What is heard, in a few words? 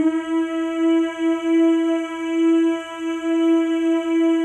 MALE, Symphony of Voices; Spectrasonics